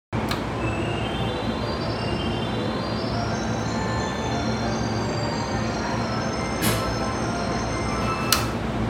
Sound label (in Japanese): 京浜東北線磯子南, train sounds